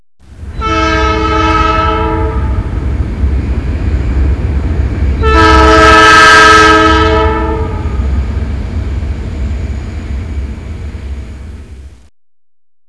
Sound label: train